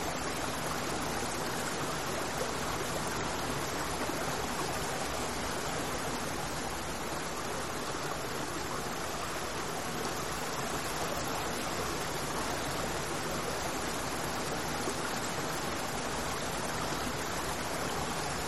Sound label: Spring water